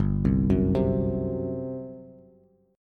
G Major 7th Suspended 4th, Bass; arpeggiated

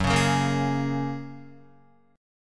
F sharp Major Add 9th F sharp, Guitar; strummed; 1,4,1,3,1,4; 4F,4A,5C,5G,5C,6E

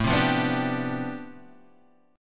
D Minor 13th A

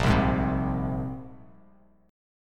Bb 7th Suspended 2nd, chord; 6,3,6,5; Bb7sus2 strummed; JGuitar

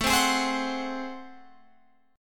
A Minor Major 13th A, Guitar, Open F; AmM13 strummed; 21,19,20,19,21,19

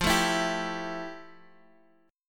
F Major 9th, Guitar, Open F; FM9; 17,16,16,14,x,x; strummed

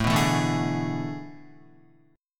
A Major 7th Suspended 2nd Suspended 4th, Guitar; AM7sus2sus4 strummed; JGuitar

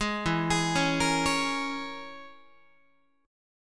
E 6th Flat 5th E down Guitar; E6b5 arpeggiated; JGuitar

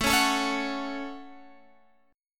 Gb Minor A

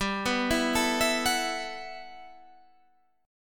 B 7th Suspended 4th G; arpeggiated

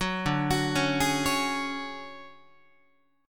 D Major Sharp 11th D down Guitar, DM#11 chord; arpeggiated; JGuitar

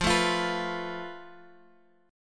F Major Flat 5th, Guitar, Open F; FMb5 strummed; JGuitar

